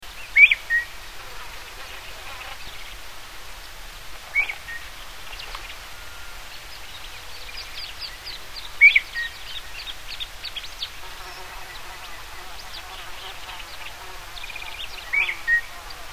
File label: Huayco Tinamou; S. America